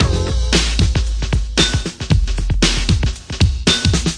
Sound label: dirty silly rap rock, 115 bpm, drum loops and breaks